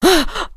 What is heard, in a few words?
female surprised, witch voice mad; npc; source engine; left 4 dead 2